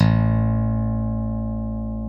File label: A0 LONG, STIK BASS; D-STIK BASS2